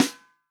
REAL DRUMS FUNK KIT

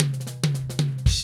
FILL, Funky Ass Loops

